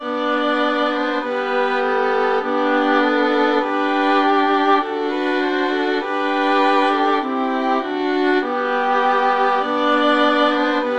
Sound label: violin trio; deck the halls